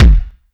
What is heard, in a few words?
kick single hit f sharp key